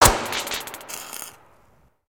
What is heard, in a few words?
Welder Pop; foley